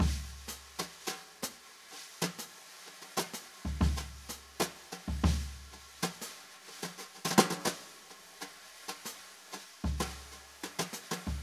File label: Brushes